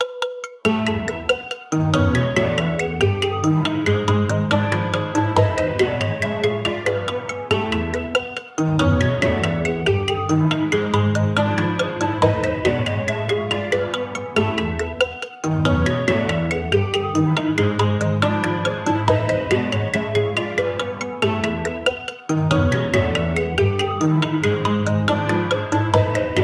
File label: playful yet dark fantasy, goblin